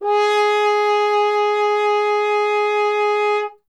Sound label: F. Horn; Miroslav Vitous; Solo Instruments VOL-2